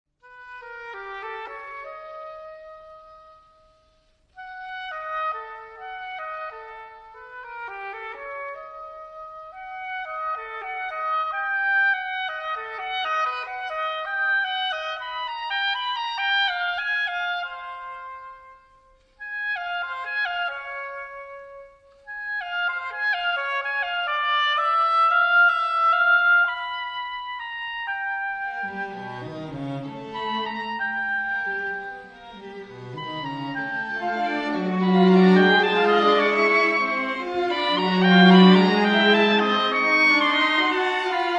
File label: concertino oboe